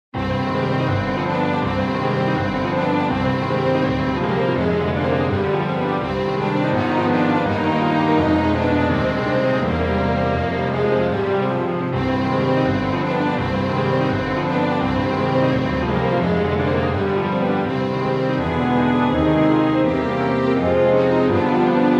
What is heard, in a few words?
piano concerto; rachmaninov; pianoconcerto2 1; orchestra